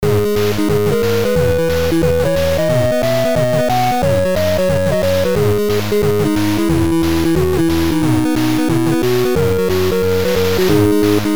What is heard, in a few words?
8-bit yay